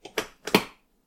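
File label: open electric kettle, 電気ポット開ける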